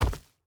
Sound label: footstep hide forest jump land; Rust